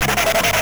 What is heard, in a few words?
skid